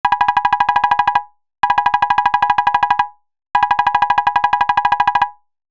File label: Beep Speech